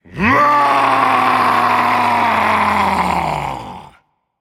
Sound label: battlecry; smite; thor